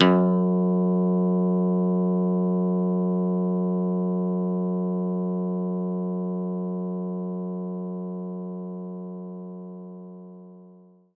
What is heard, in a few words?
Pick Bass E0; knights try